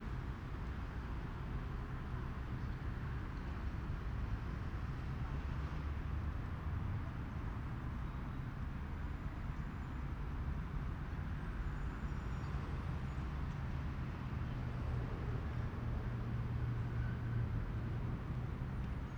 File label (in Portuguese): Rodovia longe com poucas pessoas e passaros, moto passa no final; transito; Coleção Sonora do Cerrado